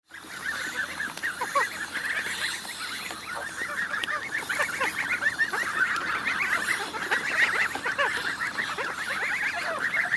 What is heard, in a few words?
young geese drinking